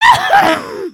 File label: failed build